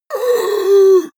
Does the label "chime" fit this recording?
No